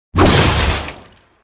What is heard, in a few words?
hit